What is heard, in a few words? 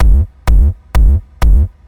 clicky hard kick techno; drum loops